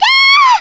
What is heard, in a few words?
Female, DeathWails; Riviera